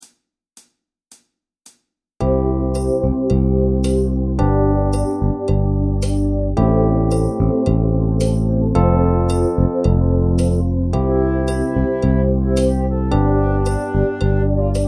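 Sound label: Drum Groove Fast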